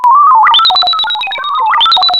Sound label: JD synth